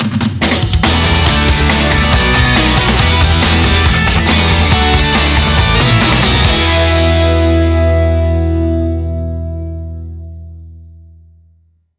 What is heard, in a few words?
bed rock